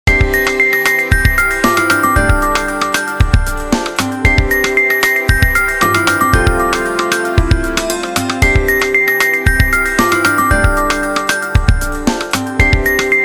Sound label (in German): der baer klingelt